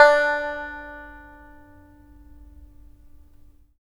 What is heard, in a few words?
SAN XUAN D5 MF; Spectrasonics; Heart of Asia